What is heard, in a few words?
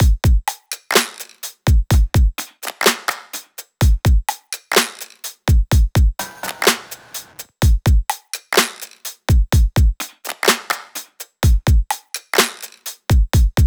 drum loop 63; Pop; Drums; Full Drum Loops; Slate; SD PASADENA Pop Sample Pack